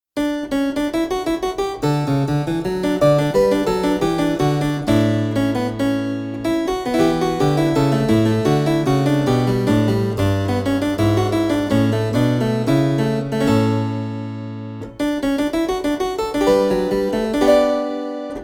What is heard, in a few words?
Harpsichord